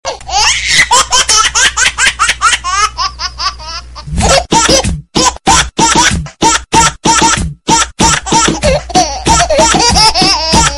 Baby Rap

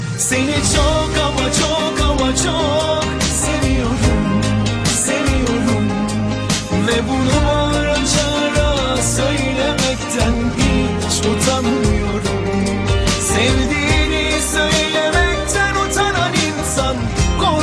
Turkish

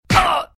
Punch

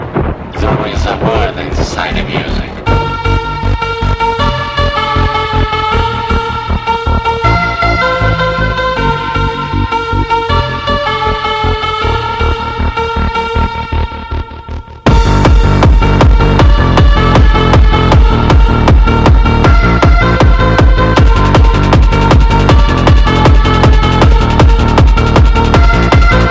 Best House Beats

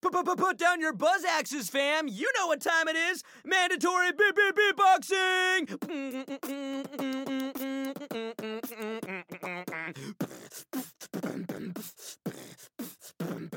Beatboxing

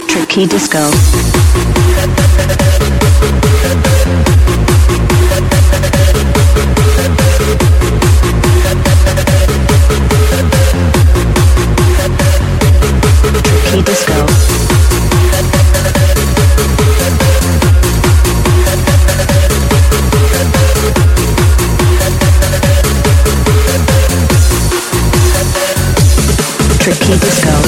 Tronik

